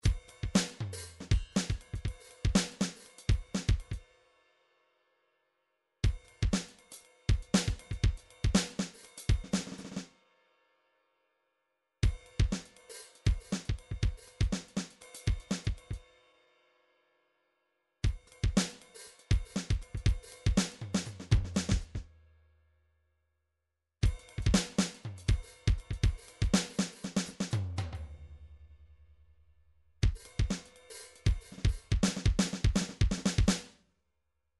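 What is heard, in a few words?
drums pattern, midi; Paul Kodish Dangerous Drums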